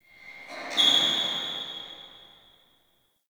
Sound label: Distant Shoe Squeak A; Warframe; Ambience; NineteenNinetyNine